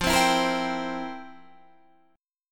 F Major 7th Suspended 2nd Suspended 4th, Guitar, Standard; strummed; 13,15,14,15,13,15; JGuitar